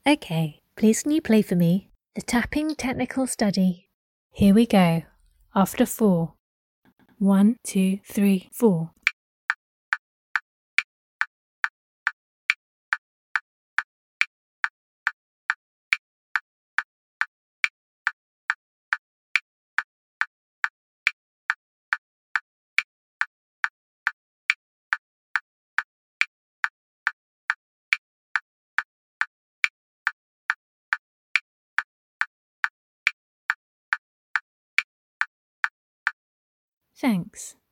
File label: Tapping study